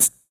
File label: juicy hi hat b key, Royality free hi-hat sample tuned to the B note. Loudest frequency: 8621Hz; hat samples